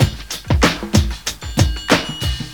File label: Drum loops, Funky